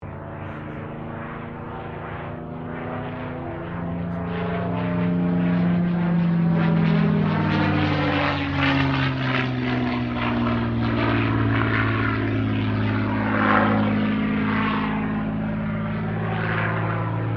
AIRCRAFT CAC CA-18 MUSTANG MK23 ROLL; CA-18 /P-51 Mustang; Airplanes; Vehicles; Aircraft Collection; Twistedtracks

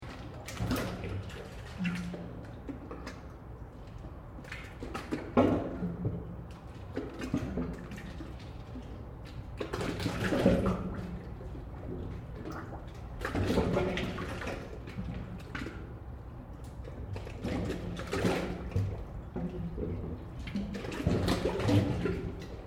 Water Waves Under Concrete Wharf Loop; Elemental weather effects; Ocean; Nature

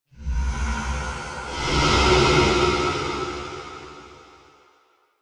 Sci-fi Monster Laser Lizard, alien; Sf Monster; Alien Sound Effects; Science Fiction Sound Effect

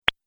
Idea Single Pop, cartoon; Cartoon Sound Design Sound Effects

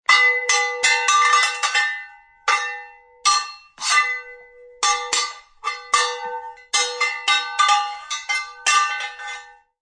Swords Clash, Two swords clash; Combat

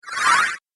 Game App Ghost, Video Game Sound Effects

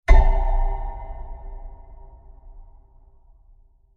Long Deep Impact; Blast Sound Effects; Blasts (Sound Effects:Impacts)

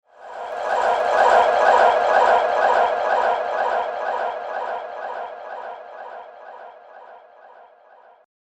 Mythical Motion, transition; fantasy; role playing games, magic videogames; Andrew Fleming

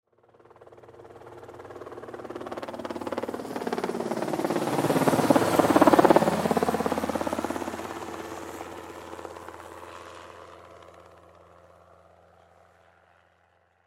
Helicopter Fly By; Chopper; Transportation Aircraft; Helicopters; Vehicles

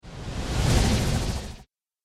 Whoosh Texture Ripple, Whoosh Sound Effects; sound design

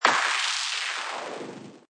Space Scifi Sound Stones, Sci-fi sharp blast; Space explosion or weapon sound design element.

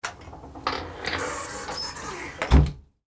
Foley, Door, Close, Glass, Storm, Door; Miscellaneous Doors; BlastWave

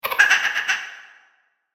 Little Devil Laughter, Evil Laugh

